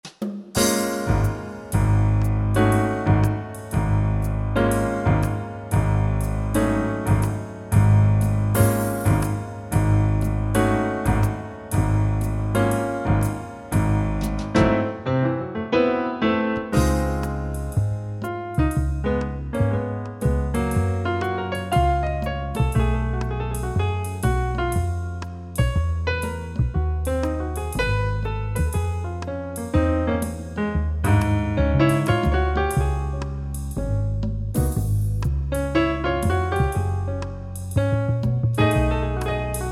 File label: Trio; wersi